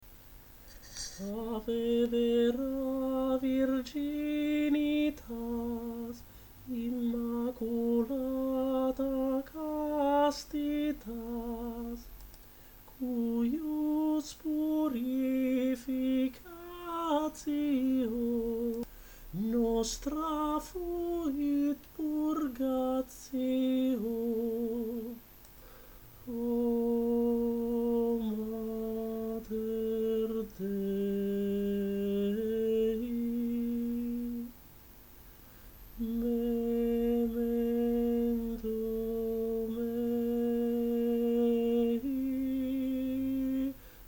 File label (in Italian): tenori; ave vera virginitas; desprez